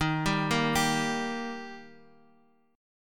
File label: D sharp Major, Guitar, Open F; arpeggiated; x,x,3,2,1,2; JGuitar